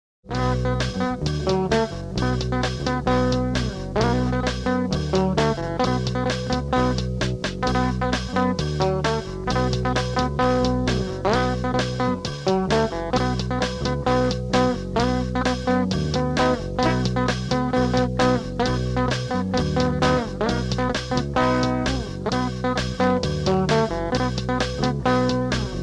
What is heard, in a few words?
say no (instr); 1990s